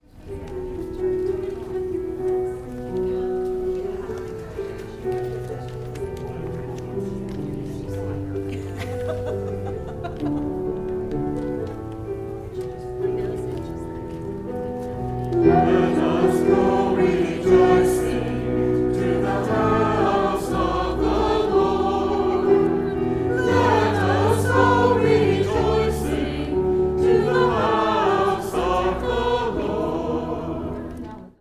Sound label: Psalm refrain melody; Gather Hymnal